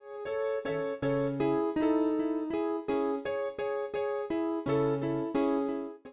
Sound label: grand piano